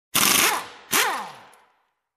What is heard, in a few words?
Wood Drilling